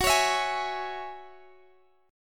F sharp 7th Suspended 2nd

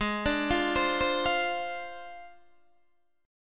G sharp 6th Flat 5th G sharp Guitar DADGAD

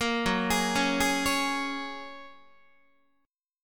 Gb Major Add 9th, down, Guitar; arpeggiated; JGuitar

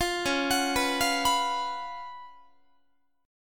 Db 11th, Guitar; arpeggiated

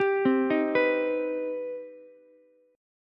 C Minor Major 7th, Ukulele; arpeggiated; JGuitar

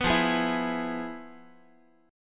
F-Minor Major 7th Flat 5th, Guitar

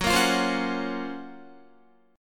Gb Major Sharp 11th, Guitar; GbM#11 strummed

